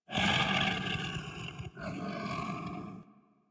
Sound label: Kubrow Feral Vocal Breath Walk; Warframe